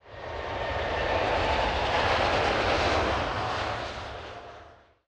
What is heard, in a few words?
TAKEOFF; airport